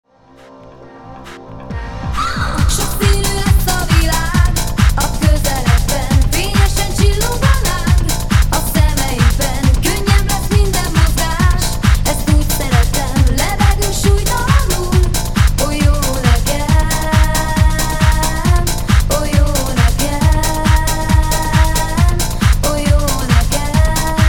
groove, Groovehou; wav: 44100 Hz - stereo

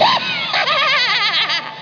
laugh

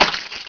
splat

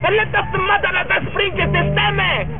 sprinklr

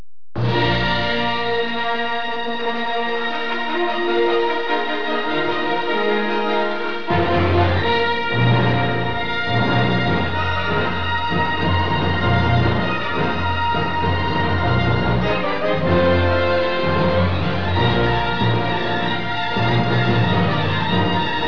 Fanfare

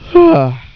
yawn

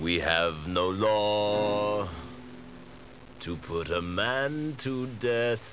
death; Caiphas' voice

